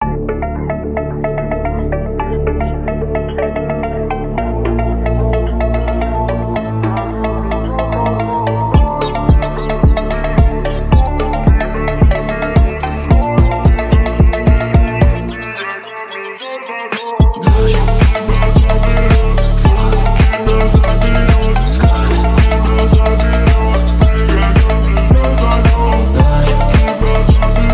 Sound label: Phonk